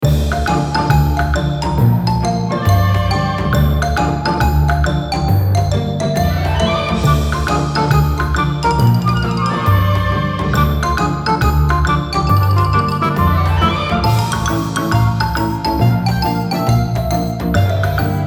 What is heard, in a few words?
Musica Menu Main Theme; DinoWitch